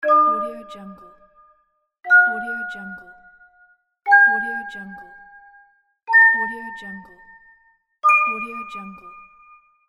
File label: happy bells bonus level up pack